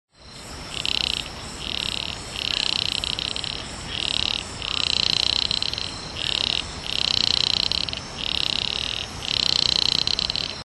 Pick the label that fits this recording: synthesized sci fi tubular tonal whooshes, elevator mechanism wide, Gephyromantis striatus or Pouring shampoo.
Gephyromantis striatus